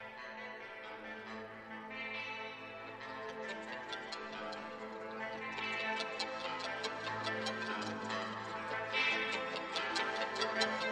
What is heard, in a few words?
Metal Opera